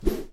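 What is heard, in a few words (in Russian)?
звук движения воздуха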